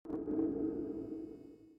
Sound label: Drums